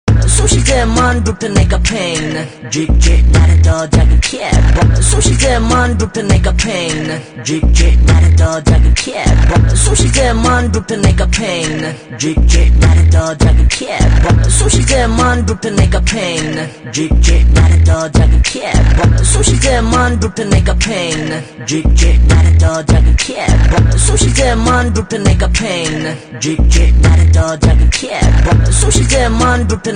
Rap

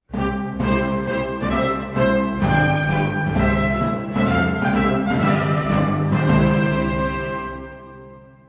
Opening Tune

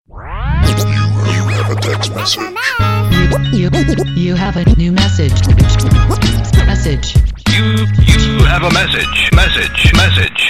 Scratch Sms

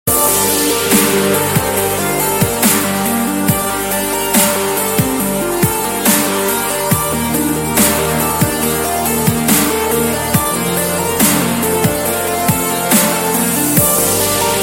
Chillout Ringtone

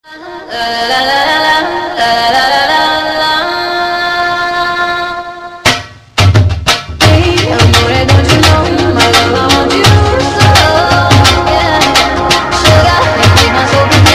Dhol

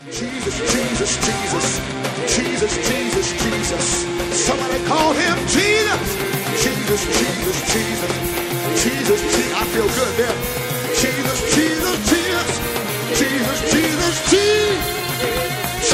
Jesus Altar Chant

